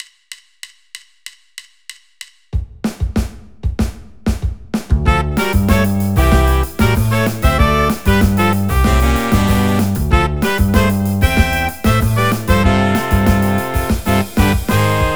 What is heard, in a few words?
Latin Horn